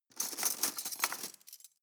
cutlery teaspoons drawer grab soft; objekte; besteck

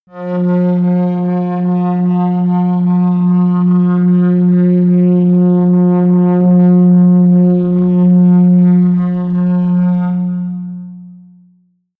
synth bass smooth long; music elements

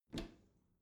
bathroom cabinet open regular; objekte; tueren

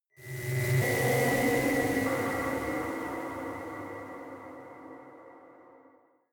space unknown alienation call tension evil; energie

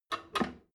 drain valve close regular, badezimmer

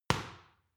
boxing paw hit medium

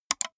gaming mouse left click single fast; maschinen; buero

